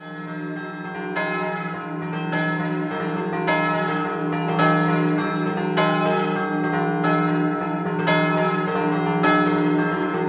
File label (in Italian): Scampanottata; Carpeneto; campane